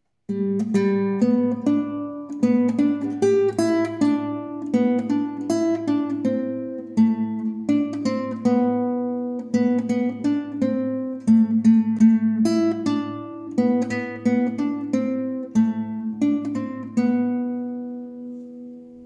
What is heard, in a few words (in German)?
Gitarre; Am Weihnachtsbaum die Lichter